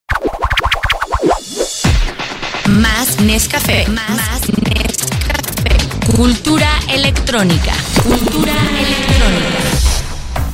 Cultura Electronica

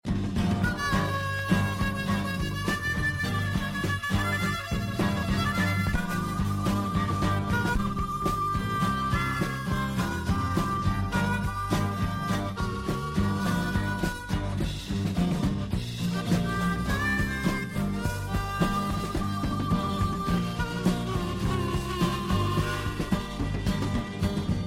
R-blues Con Armonica

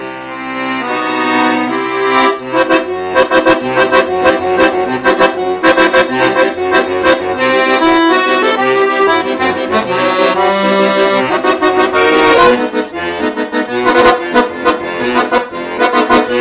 Acordeon; Los Simpson

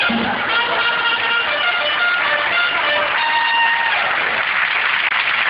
Trompeta

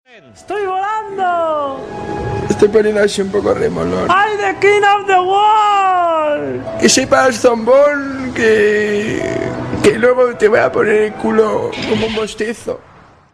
Lobo Hombre Bostezo

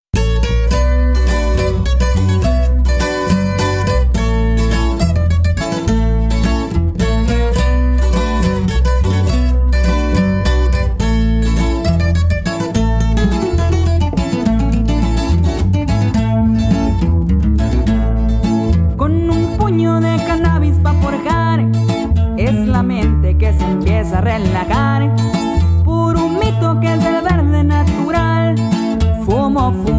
GUITARRA

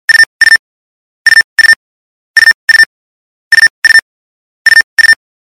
Alerta Nextel

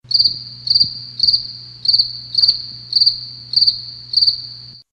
Grillo

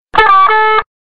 Metro Tono

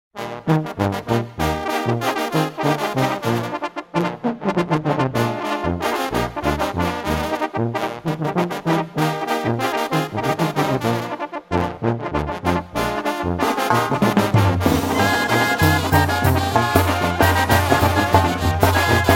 Musica Banda

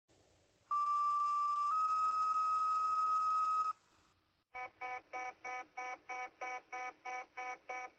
Llamado

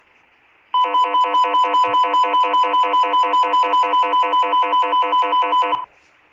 Alarma de incendio

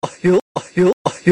Risa; Goofy

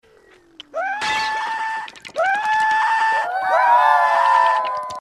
Despertador

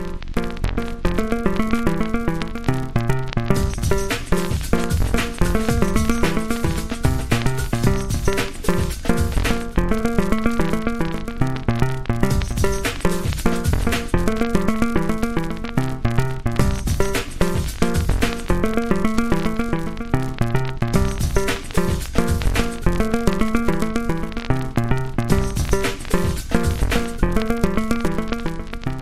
Musga Rpida

